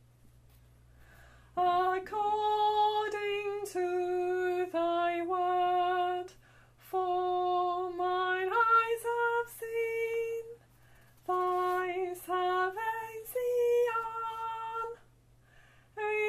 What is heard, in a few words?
Nunc dimittis alto; Byrd